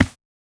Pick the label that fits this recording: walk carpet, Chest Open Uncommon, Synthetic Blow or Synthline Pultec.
walk carpet